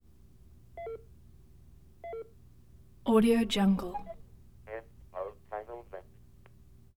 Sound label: answering machine; prosoundfx